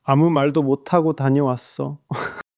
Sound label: crying